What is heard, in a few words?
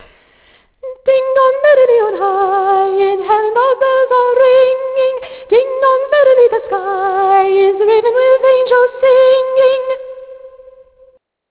soprano; baltimore